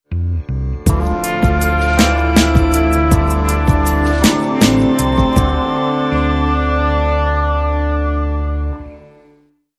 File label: Sting